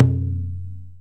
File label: stop; Amatic; Chinese Spider